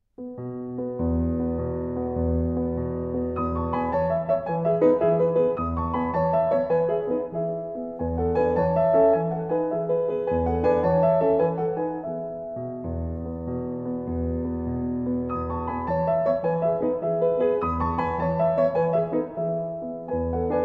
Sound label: Grand piano; P 237 Monsoon; PETROF